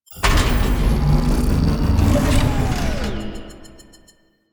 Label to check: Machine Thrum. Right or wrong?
Right